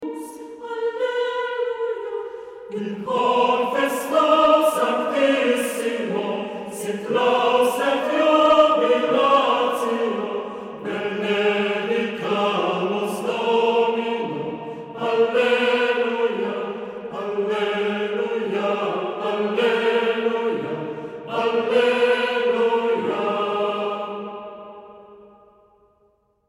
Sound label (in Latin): O filii et filiae